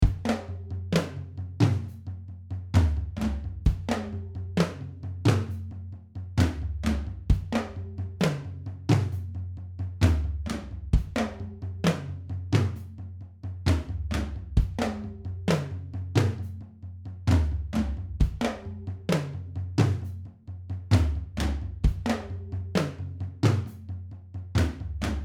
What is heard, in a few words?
Roomy Kit; Superior Drummer 3 Hitmaker